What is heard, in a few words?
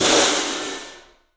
CYFX, EFFECTCYMB; 3D Sound; 3D Drums